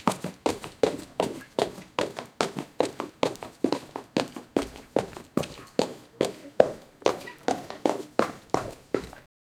light running footsteps

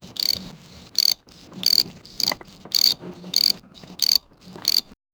sound of cockroach, insects

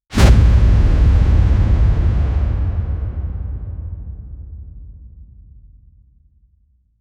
medium heavy landing; platformer

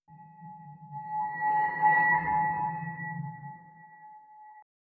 a very slight distant met, vault

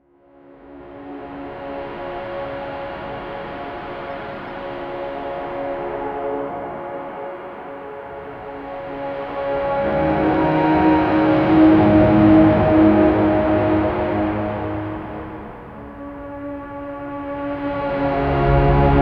epic cinematic ambient, maritime

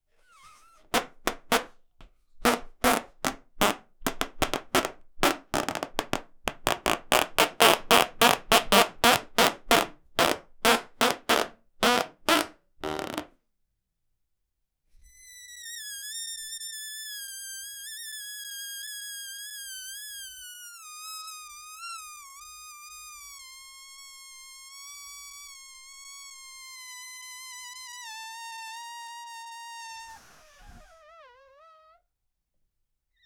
person body begin to infl, body sounds

